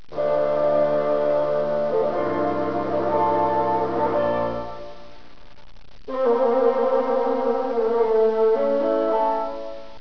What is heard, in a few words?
bassoon sound